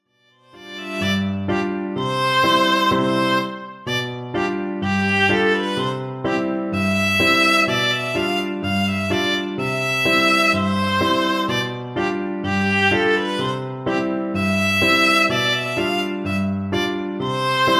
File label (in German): gavotte c dur vla kl